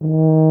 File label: BRS TUBA, Solo Instruments; Miroslav Vitous; Solo Instruments VOL-2